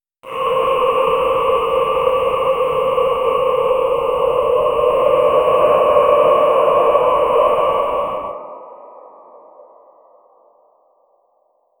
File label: create a sound like crack, paranormal